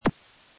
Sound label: click